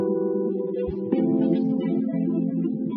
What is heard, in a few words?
Latino